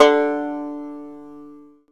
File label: Banjo D2; CMI